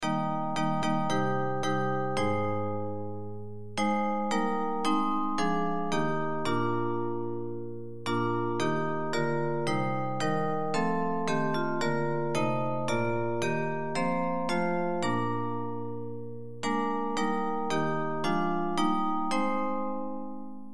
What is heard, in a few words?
Bells, Hymns